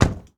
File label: Truck Ford250 Door Close; Trucks